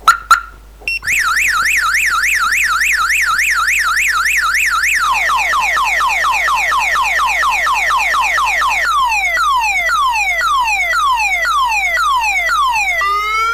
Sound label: car siren; cstrike; Xeroni